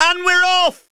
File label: start Race; netent